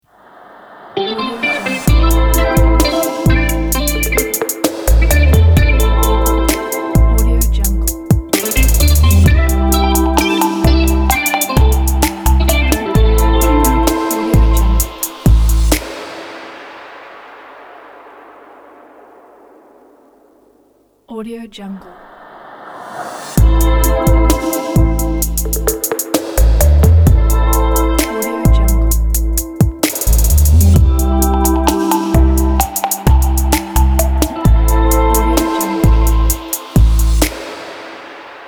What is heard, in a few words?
light trap logo